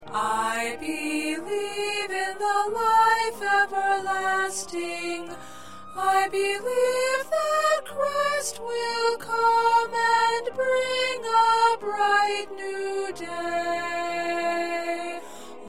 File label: Soprano